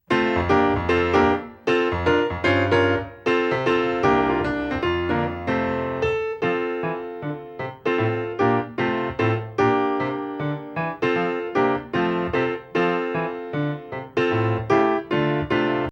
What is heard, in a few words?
accomp; good news chariots a-comin